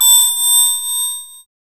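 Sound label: synth bell; E-Lab; X Static Goldmine 1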